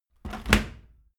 washing machine tumble drier door close

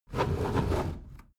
window roller shutter close soft single pull

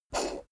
poker chip flick slide table

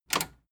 metal button tiny click enable fast

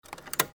door lock

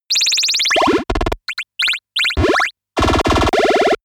arcade shooting play

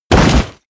bodydrop armor

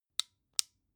flashlight off slow

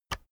keyboard external enter push heavy

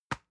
envelope drop medium

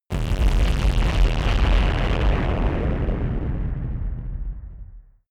massive blast strike

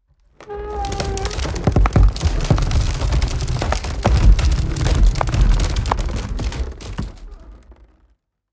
wood medium pressured

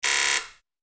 warning signal

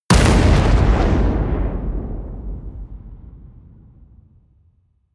missile large explode close

